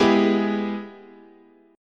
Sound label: Ab Minor Major 7th Sharp 5th, chord, guitar